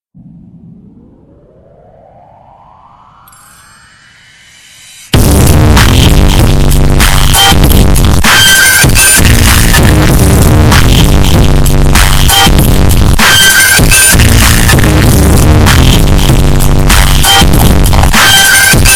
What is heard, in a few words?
bass boosted ringtone; gta sa